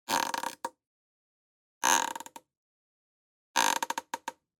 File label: Floorboard Squeak; noise creations